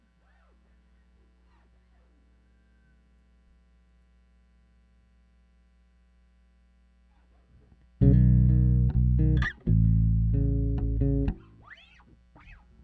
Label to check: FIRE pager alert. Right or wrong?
Wrong